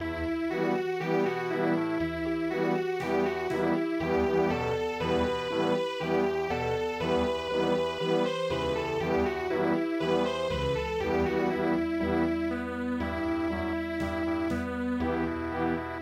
vienna waltz; owl; germany

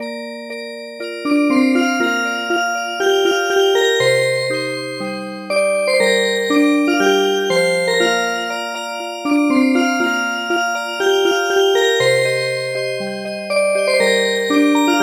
Music Box; NIHON DAIGAKU KOUKA

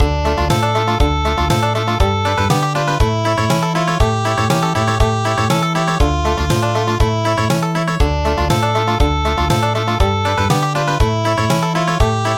Country

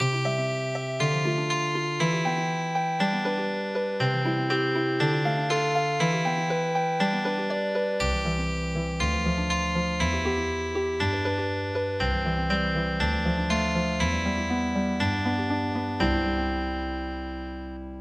Healing Harp; Delight Dance

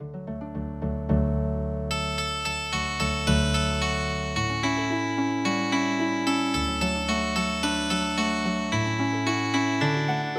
Healing Harp

